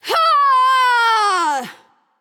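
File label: battlecry, female; smite; voicepack; hera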